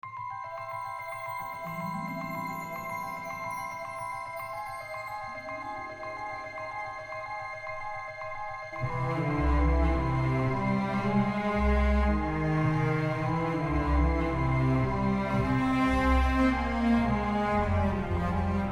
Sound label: magic, volshebnaia muzyka dlia fona bez slov